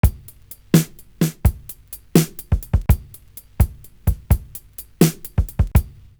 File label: LOVE SNARE; Univers Sons; 84 LOOP